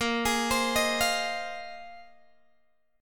Bb 7th Suspended 2nd Suspended 4th, Guitar, Open G